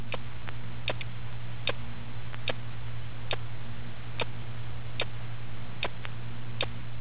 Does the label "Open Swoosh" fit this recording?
No